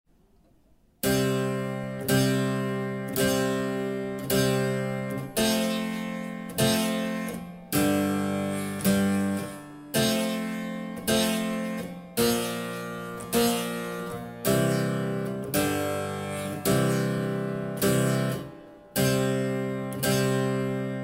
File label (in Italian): CLAVICEMBALO Pavana accordi con arpicordo; Pavana in passo e mezzo